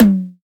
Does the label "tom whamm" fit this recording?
Yes